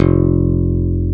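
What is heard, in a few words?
B flat 0 pick, pick bass; AKAI S-Series CD-ROM Sound Library VOL-7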